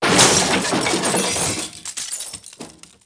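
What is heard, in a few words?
riot bang, panic; zones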